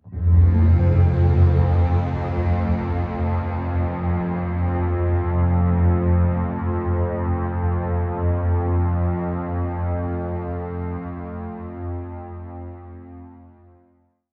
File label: braaaaaaaaaaaaaass; pad